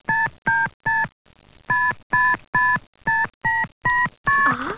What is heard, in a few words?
PHONE, 电话; 特酷声音素材库